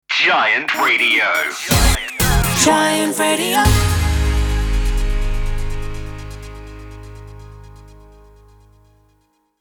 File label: Sung Jingle 124bpm Abmaj Sting; Giant Radio